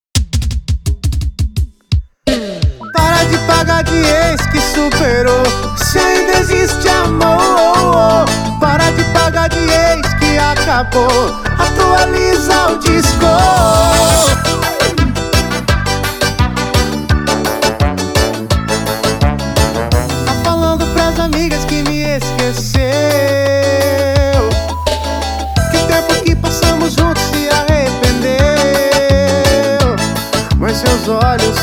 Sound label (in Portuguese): piseiro